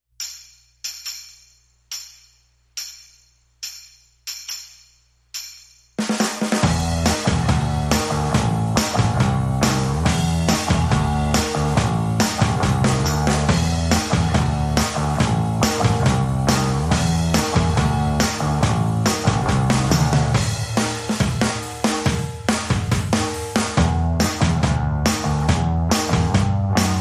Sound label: Drums And Bass Mix